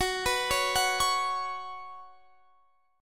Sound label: F sharp Suspended 4th, Guitar, Standard; arpeggiated; F#sus4